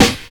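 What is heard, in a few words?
trashed snare; Drums In Your FACE!; 90 s Sample CDs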